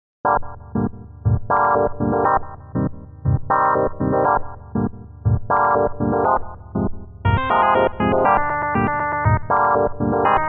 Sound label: pad tango resolute